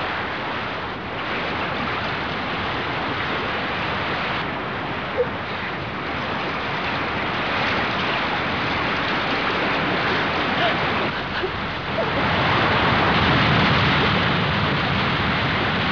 Water, 水声; 特酷声音素材库

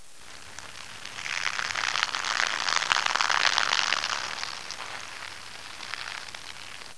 Rain, 雨声; 特酷声音素材库

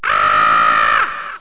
Bird; 特酷声音素材库

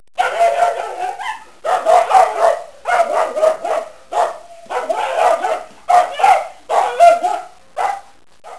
DOG, 狗; 特酷声音素材库

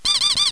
Bird; 特酷声音素材库

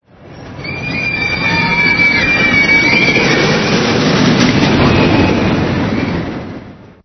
roller coaster scream; 暴力赛车

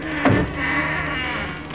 Wind; 特酷声音素材库; 自然; 风声

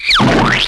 Cartoon; 特酷声音素材库

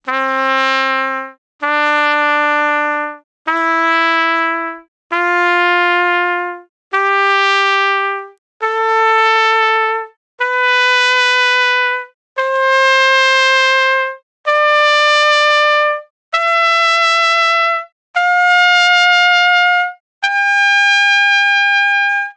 Joy, happymusic; 特酷声音素材库; 背景音乐

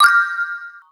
click

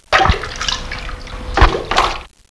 Water, 水声; 自然; 特酷声音素材库

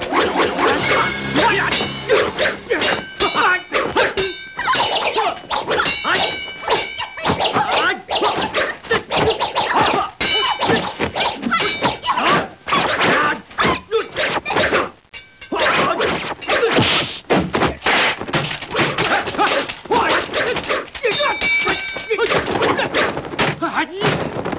Fight; 特酷声音素材库

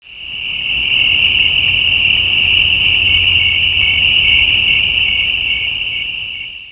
Insect; 动物; 特酷声音素材库

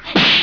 Fight; 特酷声音素材库

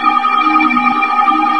Ufo; 暴力赛车

